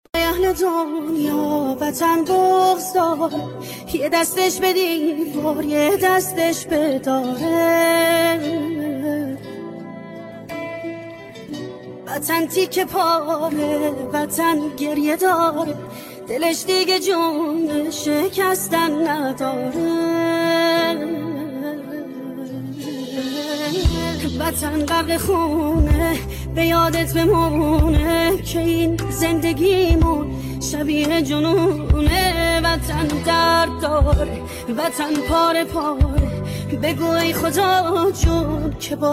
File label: sedaye zan